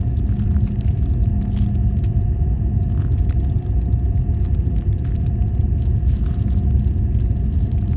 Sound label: ship transport idle; Stellaris; biogenesis; ships